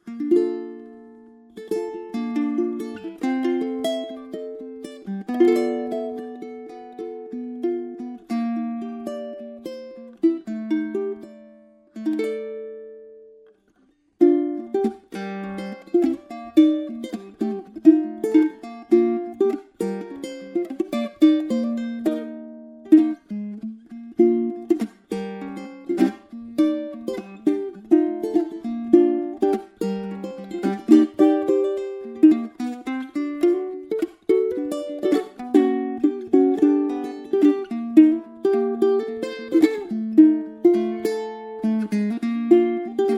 Cocobolo Baritone Ukulele Rythm

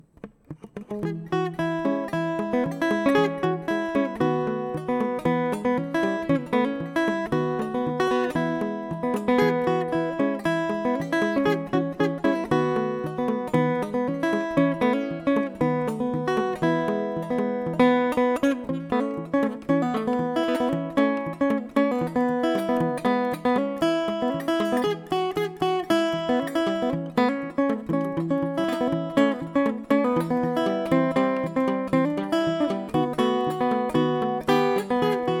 Uke on my way to Kauai

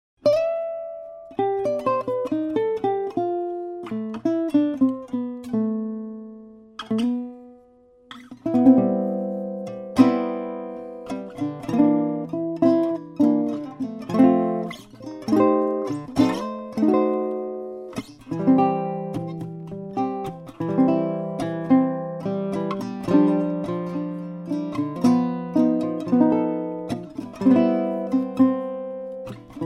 Maple Archtop Ukulele

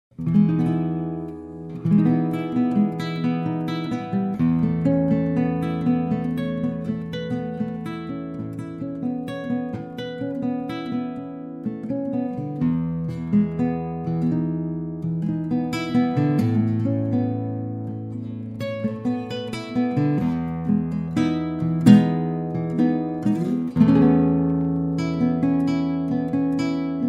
Custom Dream Guitar

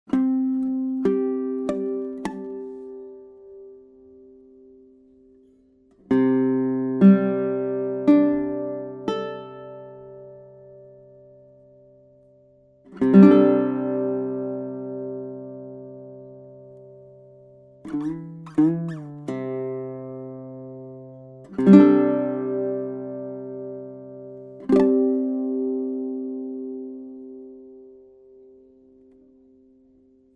Fretless Ukulele

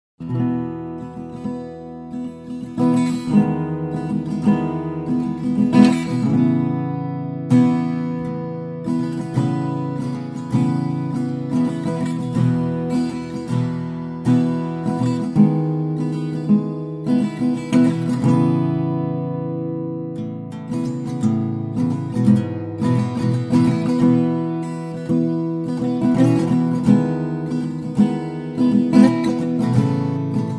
Cocobolo Parlor Guitar

